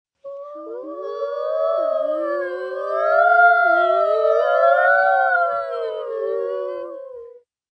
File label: Group Ghosts, Imitation, 3 children, group, imitating ghosts, wind, high-pitched, kid; human voice; Exclamations